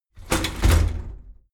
shower slide door inside close heavy halfway

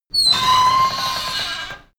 metal door screak thriller open

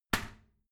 toilet seat lid slam soft, badezimmer; haus-garten

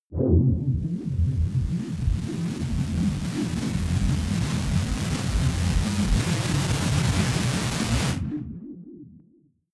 wobbly distortion, Risers; whooshes rises

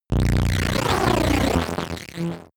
break down; energie

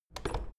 door plastic handle pull down creak

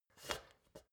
step ladder metal scrape single

